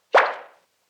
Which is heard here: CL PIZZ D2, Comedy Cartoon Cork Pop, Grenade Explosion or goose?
Comedy Cartoon Cork Pop